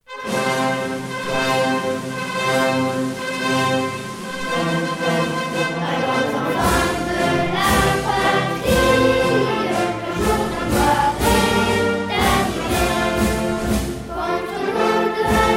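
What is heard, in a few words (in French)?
Marseillaise chant élèves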